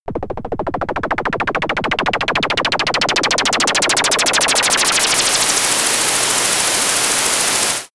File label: synthetic spin